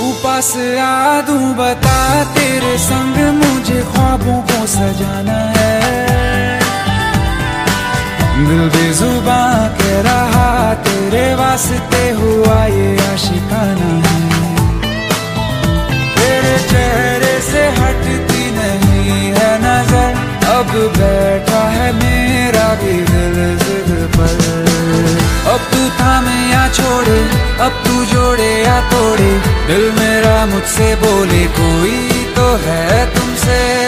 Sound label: Hindi love Song Ringtone